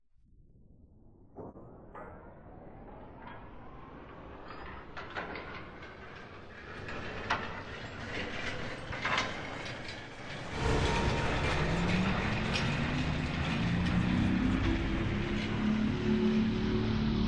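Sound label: ambient multichannel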